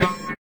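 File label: guitar; fofix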